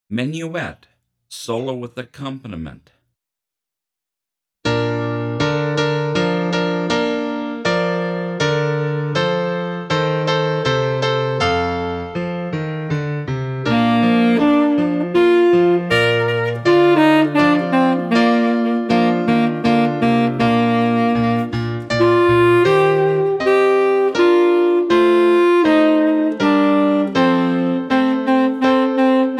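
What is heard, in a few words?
Menuett Tenor Full, Tenor Sax; 40pct Slower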